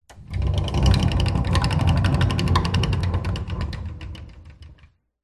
household wooden sliding door roll light room reverb; zapsplat